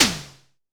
Drum X Snare; Pearl Drum-X

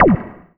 BLIP, Anime Sound FX, SCIFI

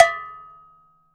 cha bell lo; CUBA

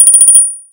Star Instruments Synare PS-1

